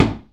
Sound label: Muted Thud